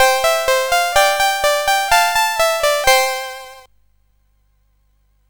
Clik Clak, CSG8580R5

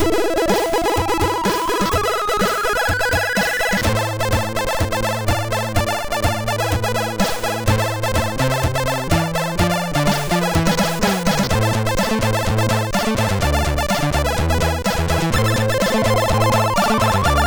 Intro Jam, MOS6581R2

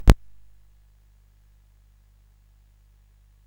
CSG8580R5, GAMES; Spikes Peak

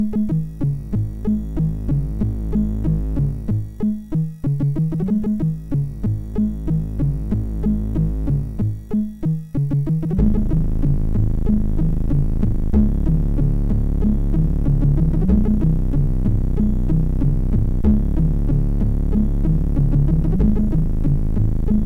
sid, MOS6581; hvsc

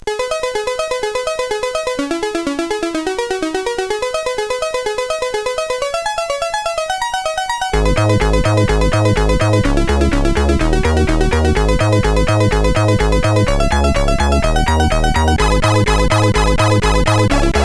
New Techno, CSG8580R5; hvsc

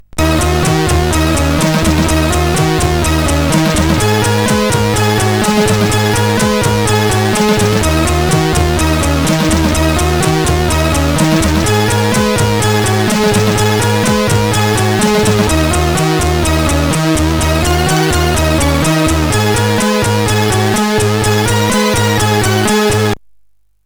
Music CSG8580R5

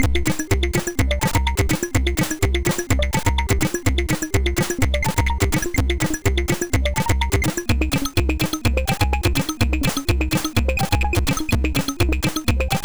Acid, sid MOS6581R2

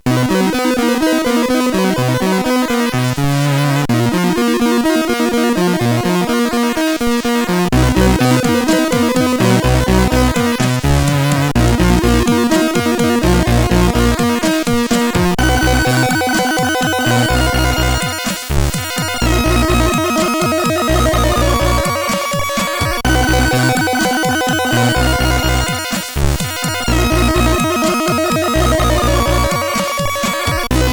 Slow Metal sid CSG8580R5; Digger; hvsc

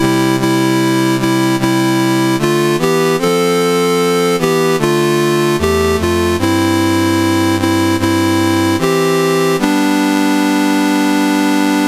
Namibia, National Anthems; CSG8580R5; Dave Marquis

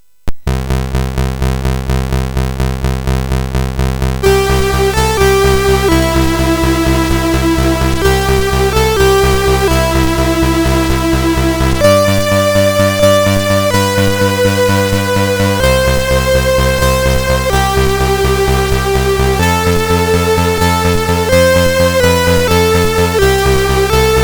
Stille Nacht sid MOS6581R3

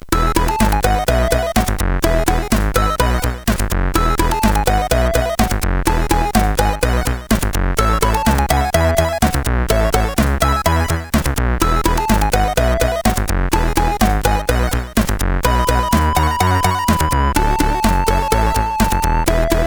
Rock, CSG8580R5

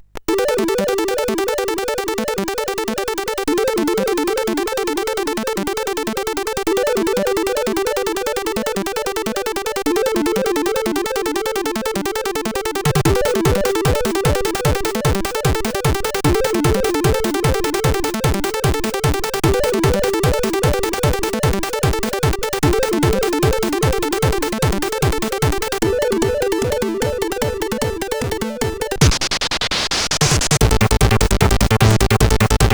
Detect Failure, CSG8580R5

